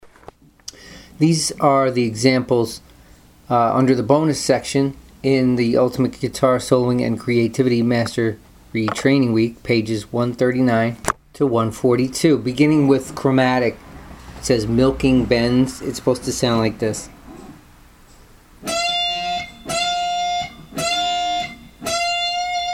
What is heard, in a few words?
string bends examples, guitar